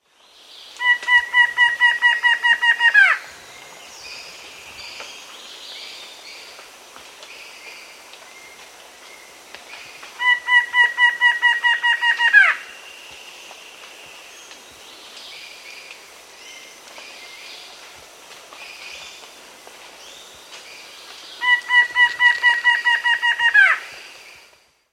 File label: Plain-Winged Antshrike, S. America